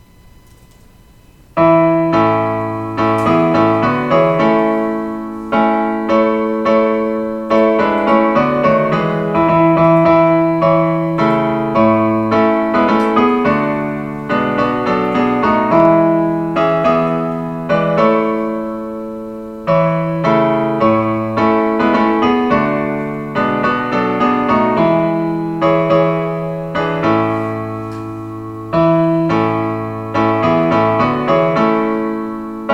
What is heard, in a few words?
piano tutti